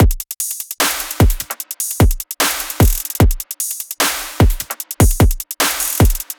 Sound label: Future Bass Kit 150bpm Full, drums; EDM Drums; Drum Kits; Stems; Ghosthack; Ultimate EDM Drums; Drum Mega Bundle; Future Bass Kit 17